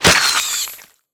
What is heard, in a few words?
Trilobite die